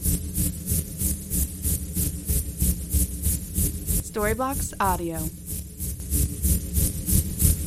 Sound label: Ambient House Bass 125BPM F